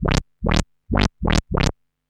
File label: Arp D Line, ARP Odyssey; Lines; synth; musicradar-synth-samples